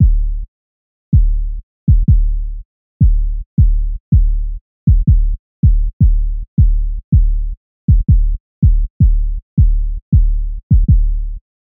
sub kick example

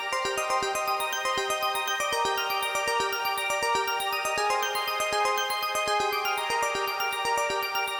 sequence with no velocity

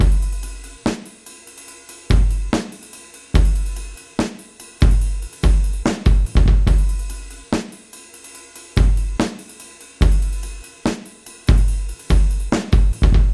acoustic drum loop with synth kick

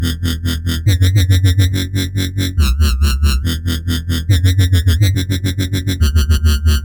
wobble example

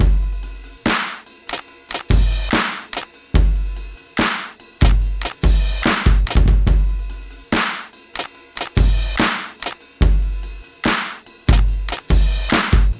acoustic drum loop with cymbal

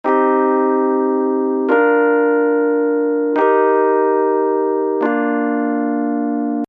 keyboard wet

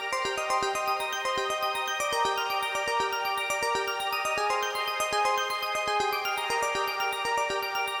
sequence with velocity

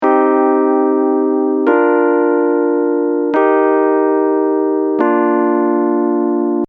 keyboard dry

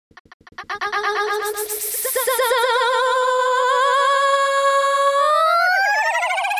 time stretching effect

